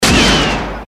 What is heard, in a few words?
shot